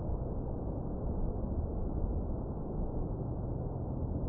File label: dry air short tunnel, ambient wind; css